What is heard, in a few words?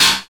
CYBER KIT, DRUMS